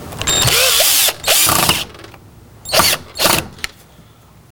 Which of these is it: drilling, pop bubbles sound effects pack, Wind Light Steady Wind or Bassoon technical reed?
drilling